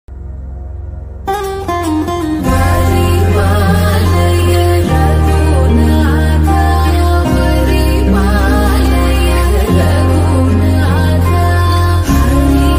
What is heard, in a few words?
song ringtone instrumental ringtone